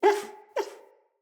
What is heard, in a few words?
Wolf Cub Trapped Call Far; Animals; Wolf Cubs; Orengal Wolf Cub A